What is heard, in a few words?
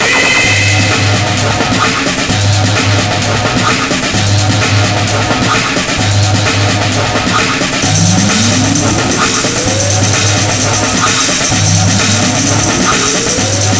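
background music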